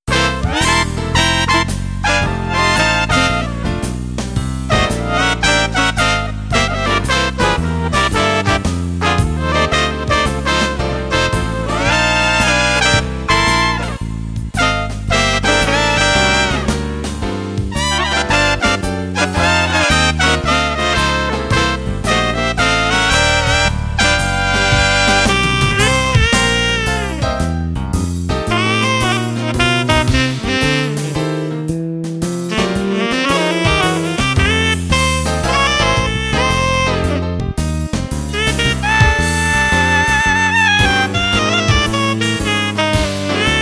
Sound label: brass blues; XPression